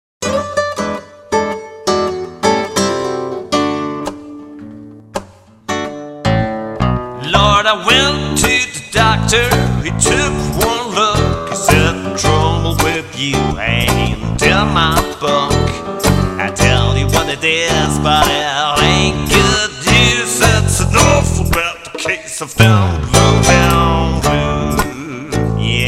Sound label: Low down Blues